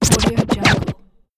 scratching; fx-market

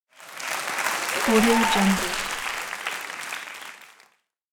audience applauding

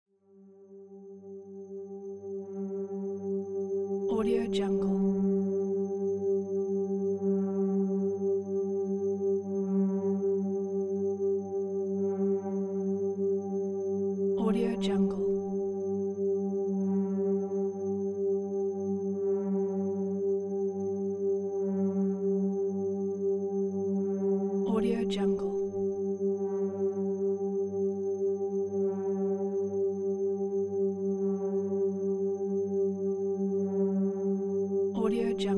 pulse drone

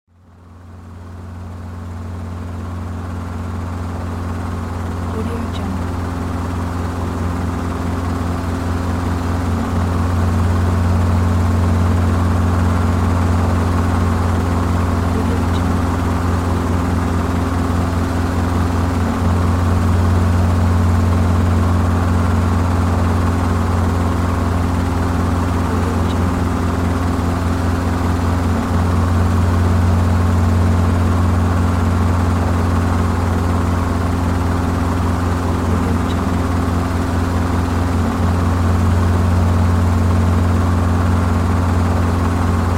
helicopter ambiance

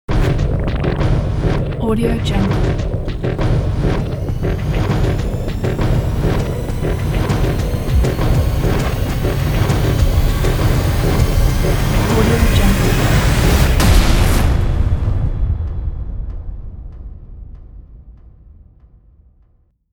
cinematic logo ident

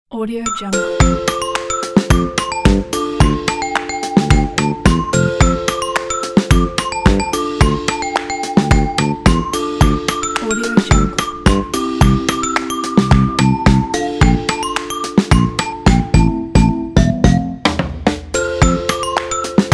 happy playful joy; agile sounds

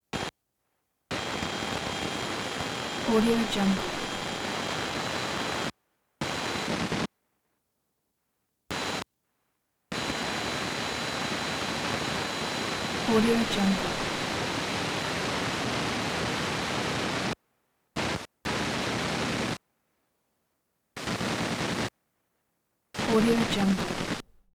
video static interference shortwave radio

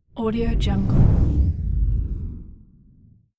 soft explosion energy whoosh; hollywoodedge

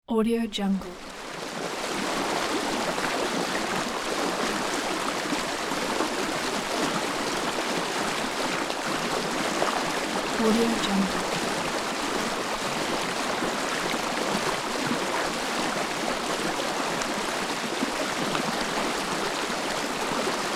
flowing water

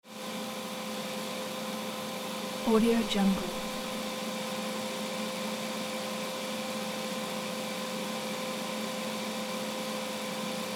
pc computer fan noise

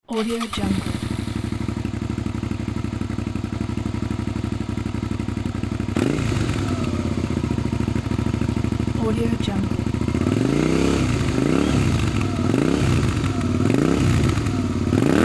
motorcycle

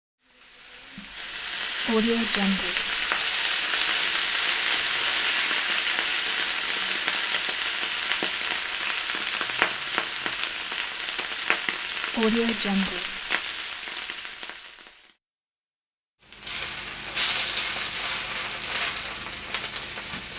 meat in the pan

